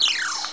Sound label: wheee fall; charley cat xmas